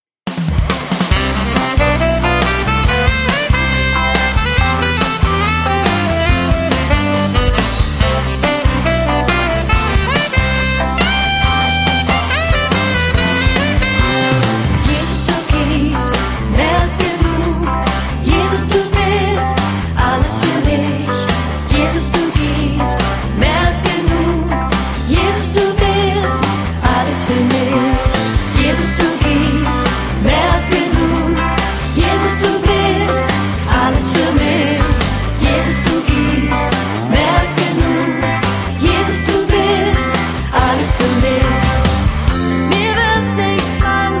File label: Gospel Celebration Choir